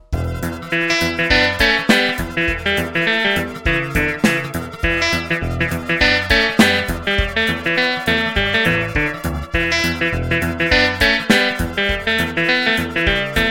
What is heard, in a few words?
key funky clavi